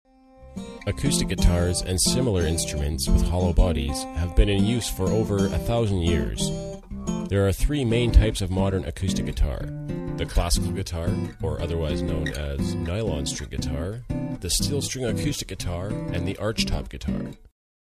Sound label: guiter